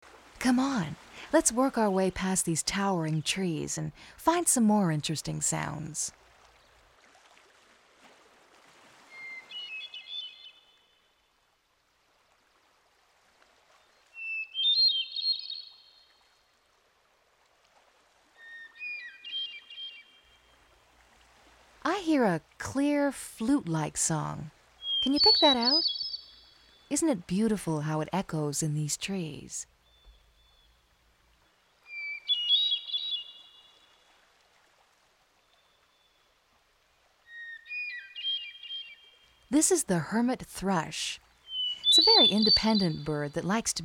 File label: hermit thrush